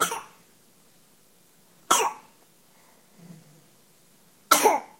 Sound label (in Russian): nazoylivaya pesnya beskonechnaya, zvuki kashlya rebenka